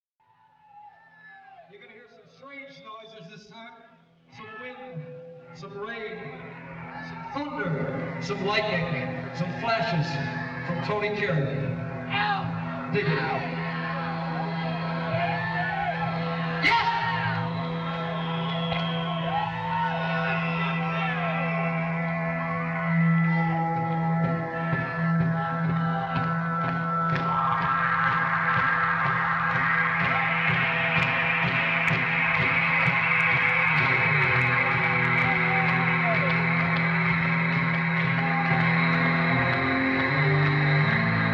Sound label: Keyboards Solo